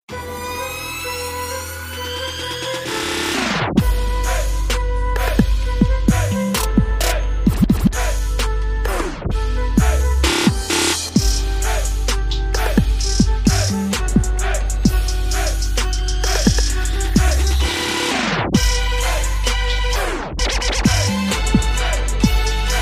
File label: theme song trap remix; Southland